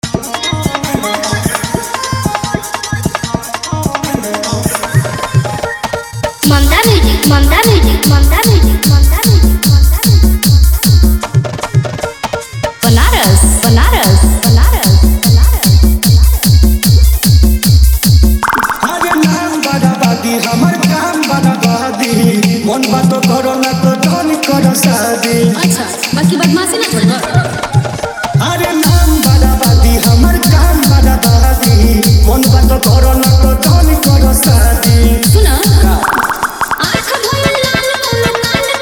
New Bhojpuri Dj Remixes Songs

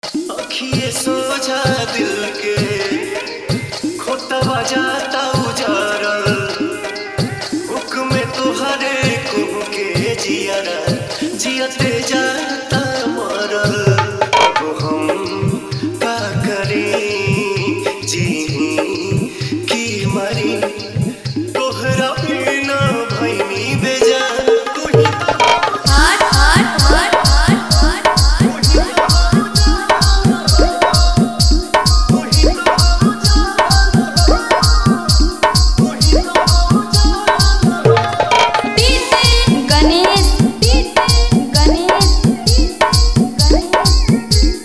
New Bhojpuri Sad Song Dj Remix Song

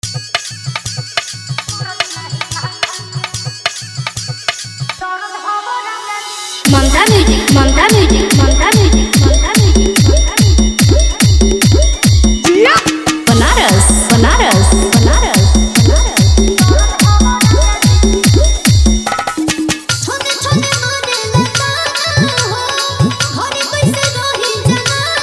New Bhojpuri Dj Remix Song

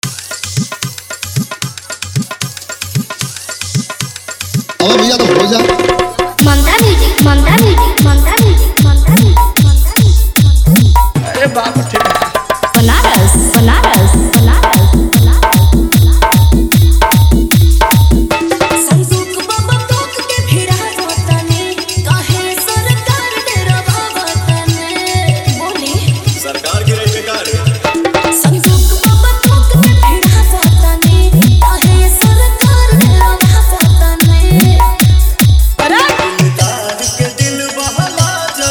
New Bhojpuri Dj Remix Song

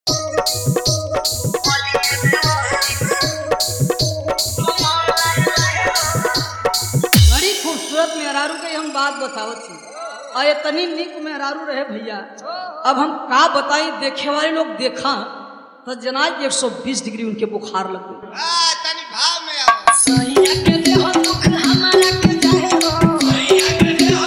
Hard Bass Mix

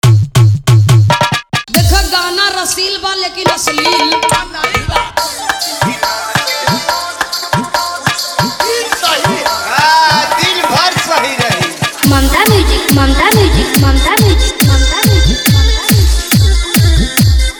New Bhojpuri Dj Remix Song

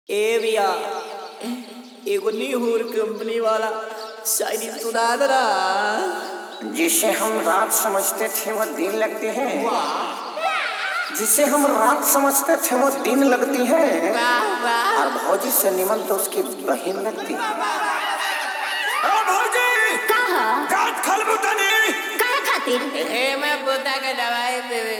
New Holi Dj Remix Songs

